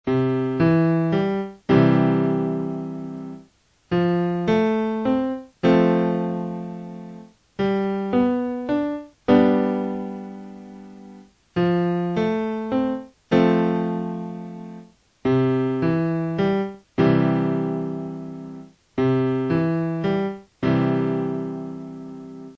3 codes, Blues

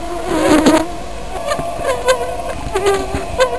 buzzing

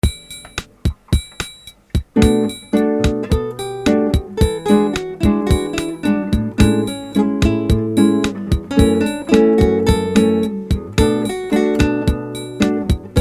Bossa Impro; SambaBossaNova

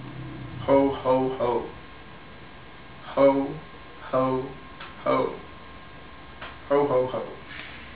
hohoho

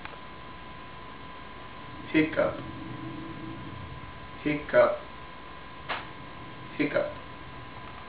hiccup

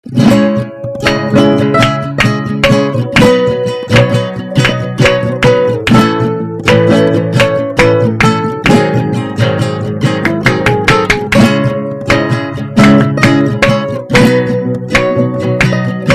A bit of Framenco

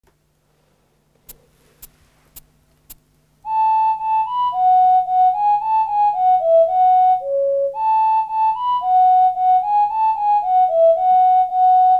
Original Irish Traditional; Ireland

